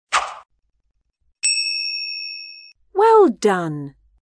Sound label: correct shoot